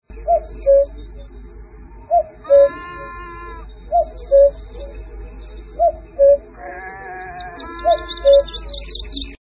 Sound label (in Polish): kukułka - głos alarmowy; kukułka z owcą